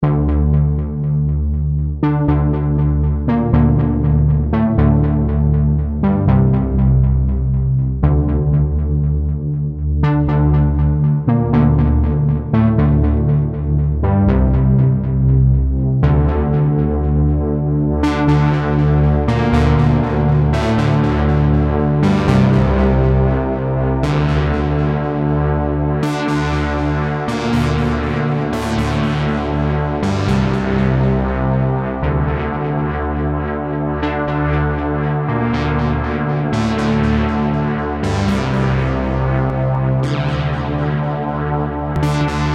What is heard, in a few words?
SX240 modulations